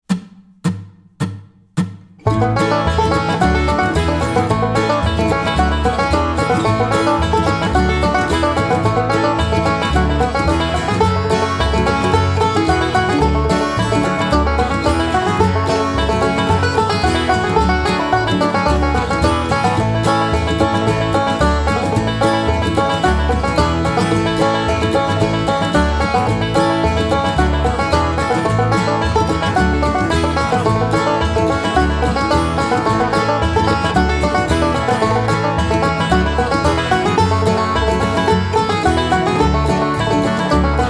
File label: Banjo Test Play Along, melodic banjo; melodic banjo lesson; Boys of Malin